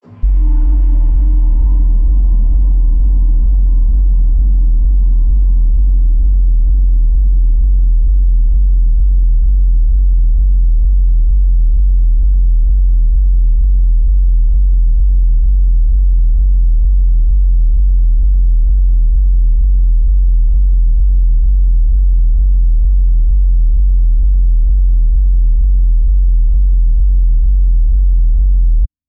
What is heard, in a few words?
Sub Bass